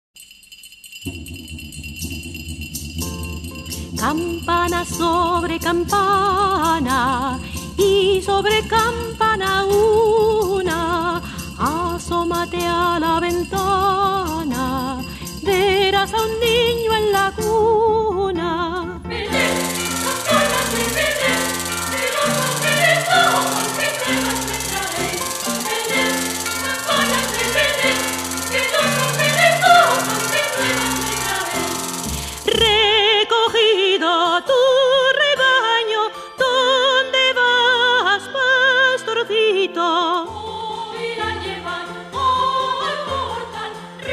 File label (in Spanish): Campanas Sobre Campanas; Christmas